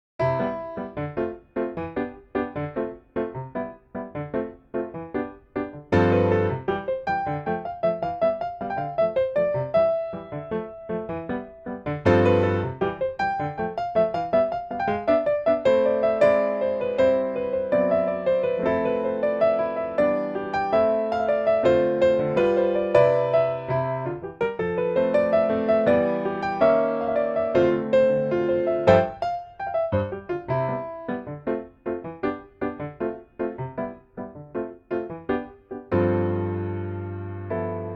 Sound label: Piano; Phoenotopia Awakening